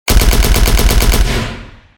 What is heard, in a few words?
gunshot; tridroidznnpc